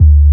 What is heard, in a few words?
bass, 140 BPM, Drum & Bass; Ueberschall; Drum & Bass Resonance